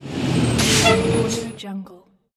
bus stop arrival

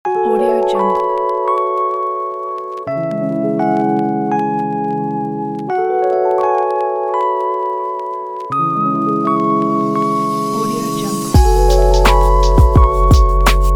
it is lofi

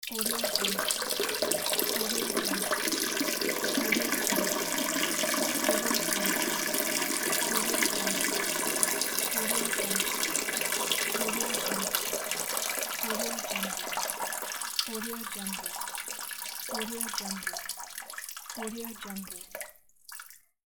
peeing in a toilet

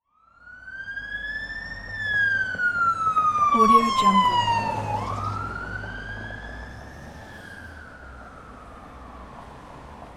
ambulance

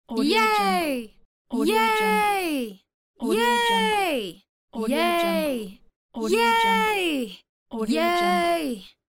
girl cheering yay

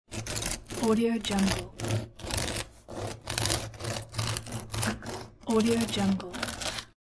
cutting bread

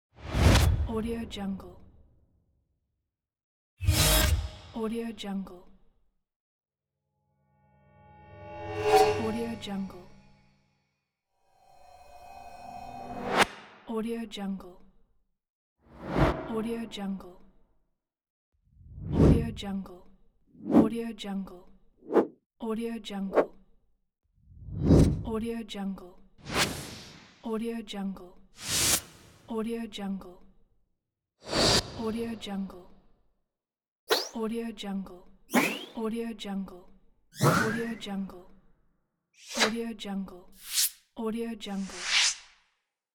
whoosh

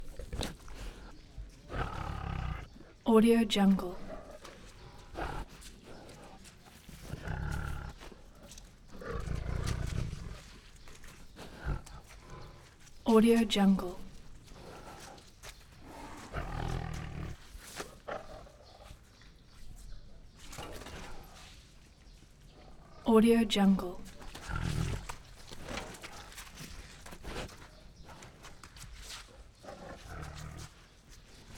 tiger pacing

